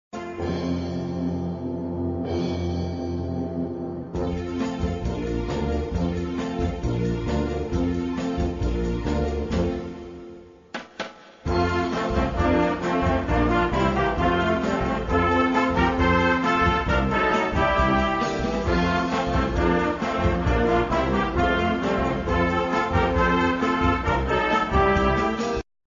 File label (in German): Weihnachtslied Medley